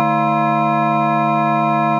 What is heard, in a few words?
Static Chord; Vector FM Presets